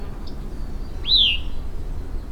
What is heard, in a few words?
Hybrid call